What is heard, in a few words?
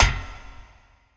foot thick metal; Earth and Beyond; EA Games